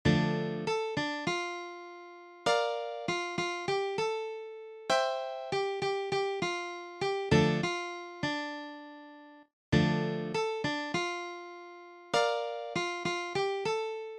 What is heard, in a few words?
Reversation MIDI